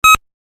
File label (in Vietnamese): Tiếng bíp một tiếng duy nhất